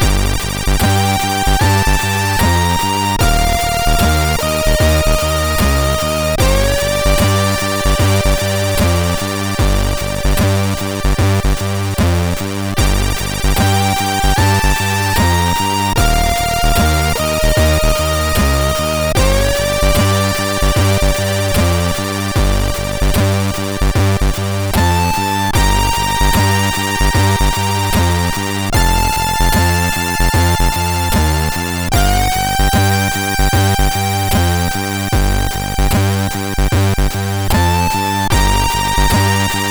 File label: sid CSG8580R5; Paddlepong